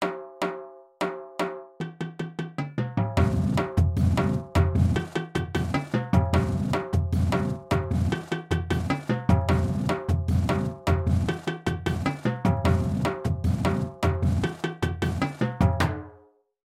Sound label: Beat; Hot Chick